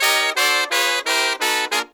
Pop & Funk Brass

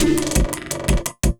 LOOP, Drum & Bass, 170 BPM; Ueberschall; Drum & Bass Flash Trackz

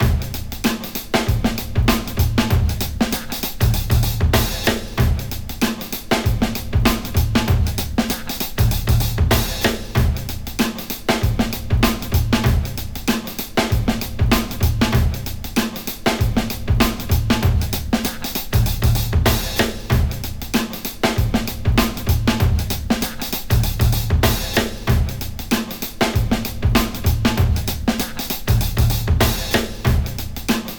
96.5 Madbounce Dustbin, breaks; Lockdown Breaks

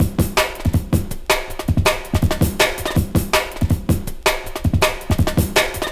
Cool Break, breaks; m8 breaks

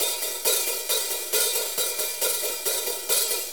4-4 HiHat Loop

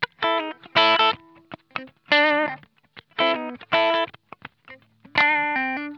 BLUE, Funk Guitar; Zero G - Funk Guitar

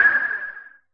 noise fx; Masterbits; Switch FX

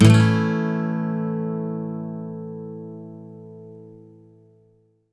MAJ SWEEP A, Electric & Acoustic Guitar Loops; SWEEPS; USB Soundscan vol.04; AKAI

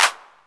Clap, Novation Drumstation

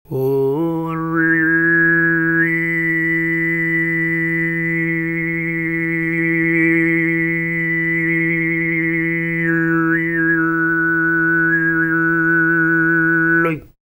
FREE, TUVAN MID; Spectrasonics Vocal Planet CD5; World Voices

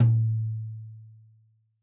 Tom2 Stereo, Deeply Sampled Drums; SMDrum

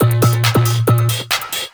percussion loops 138; PS-45

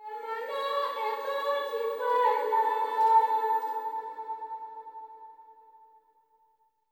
LTLE GIRL, AFR.GIRL

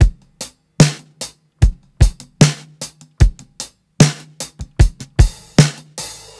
75 BEAT, drums; LOOPS; Basement drums vol 2

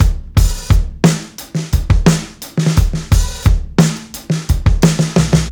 87 FUNKY, drums; Basement drums vol 2

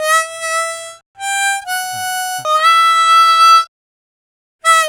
Harm 098bpm A, Harmonica

